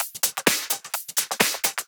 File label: Houseloops 128BPM; Vengeance Samples